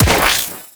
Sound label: Sniper Proj Explode; Warframe; Sentient; Crp Sent Amlg Sniper